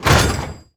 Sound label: Metal Grate Open; Doors